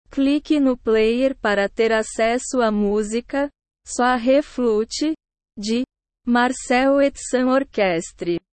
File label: flute